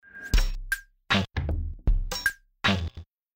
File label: tranfuser loop